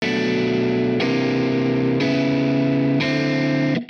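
amp wet guitar chords, Hybrid neural guitar overdrive; Morphdrive; Demos for hybrid configurations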